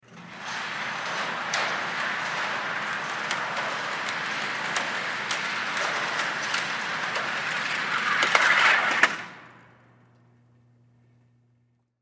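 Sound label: Shooting Range Interior, Shooting Range Rail Slide Back 10 Ft; Miscellaneous Sport; Sports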